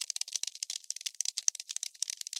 crawl, insect; ambient

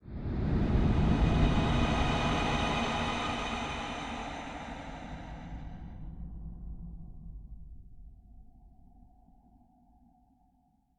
cave, ambient; Minecraft

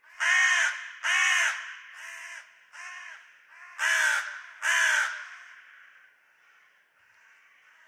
crow; minecraft; Remastered; ambient

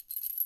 chain, step

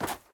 snow run, step; blocks; Minecraft; Remastered

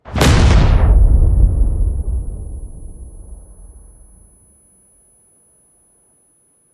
explode

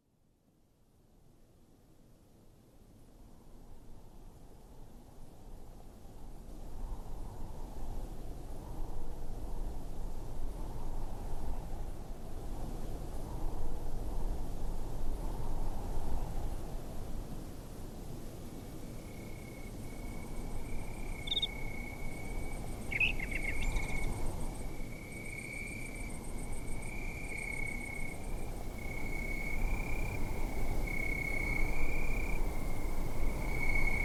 desert night, ambience; ambient; minecraft; Remastered

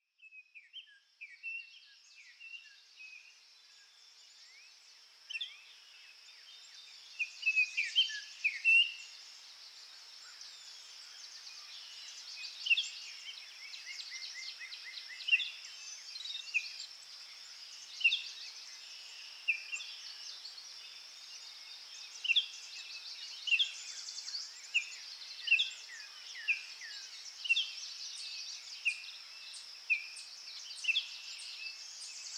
chirping, ambient, bird

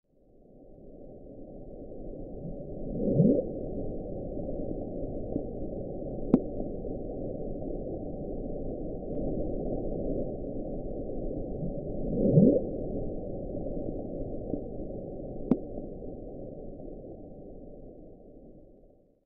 bloop, underwater

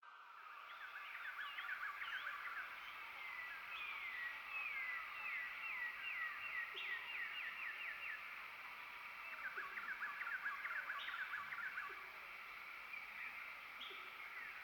jungle, ambience; ambient; minecraft; Remastered

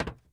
metal box run; blocks; step

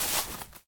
moss step; blocks

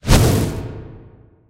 fireball

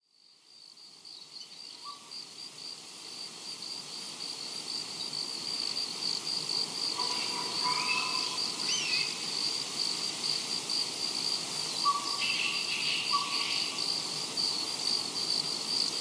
savana, ambient ambience; minecraft; Remastered

